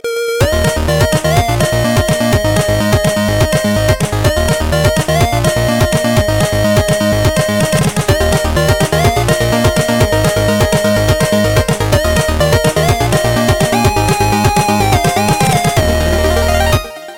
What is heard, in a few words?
8bit; Mlp Fim